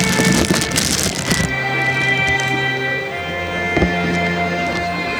plastic bag audio

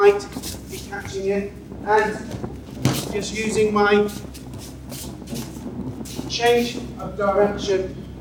background noise

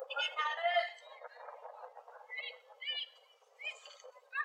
windnoise processed more

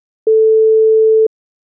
audio input noise levels line; canon xh series

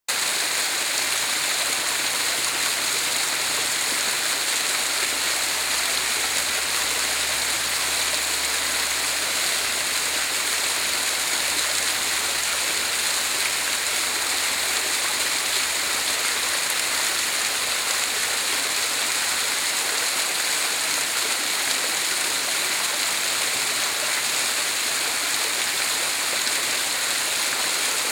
waterfalls ambient audio

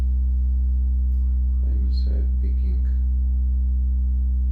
motor noise hum; ecm763; letus

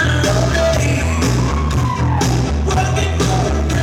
at835b vs at897 bad audio

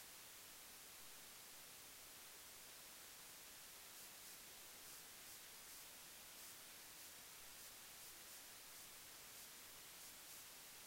very hissy pre amps noise floor

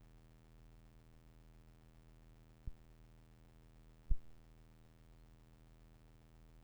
zoom h2 noise problem; h2 fault test

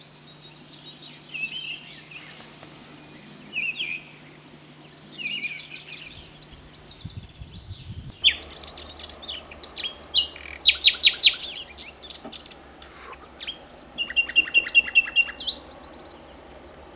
hoopoe oboe birdsong

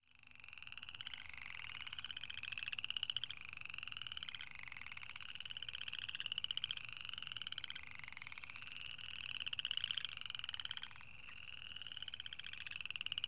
tree frogs small bi; tascam dr05 built mics; small birds

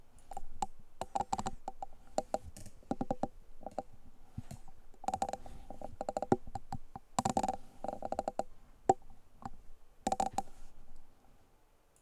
headphone noises

